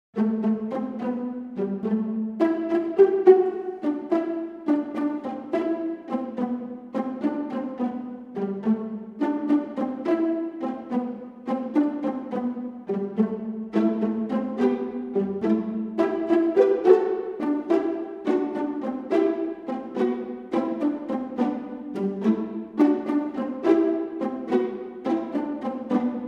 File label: strings pizzicato; Lucky Wheel